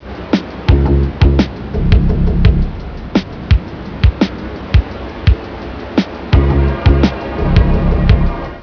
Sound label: drone